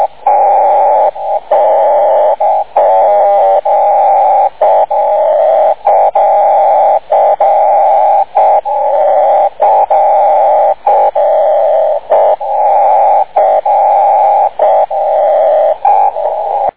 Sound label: pactor2